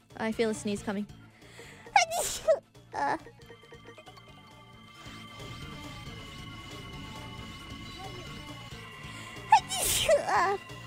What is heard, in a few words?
Sneeze